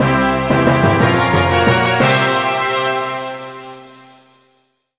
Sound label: Jingle Lvl Win; Monster House; ds